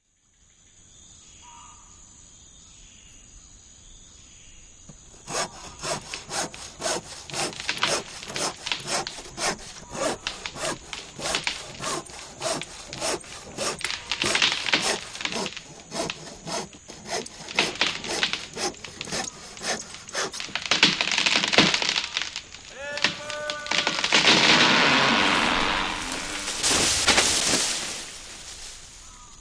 Forest Ambience, Sawing Down Tree With Wood Stress, Man Yells "timber" Tree Falls.